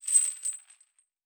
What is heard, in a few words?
Chain; Fantasy Sounds Bundle; Craft